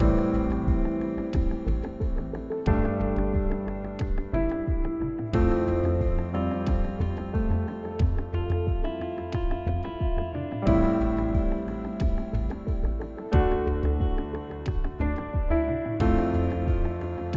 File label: Mood Normal Medium